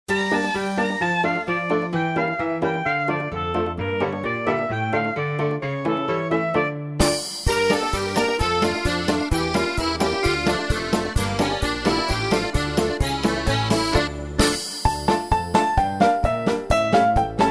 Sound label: karaoke; detskie vypusknoy